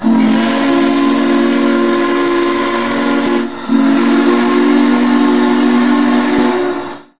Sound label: horn